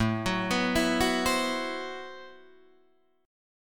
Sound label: A Minor 6th Add 9th A Guitar DADGAD